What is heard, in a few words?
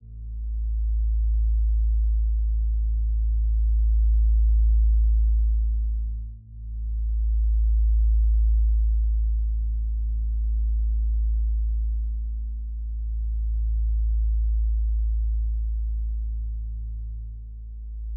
Analogue Synthesizer Filter Resonance Wave Unison A1; Low Frequency; 344 Audio; Low Frequency Elements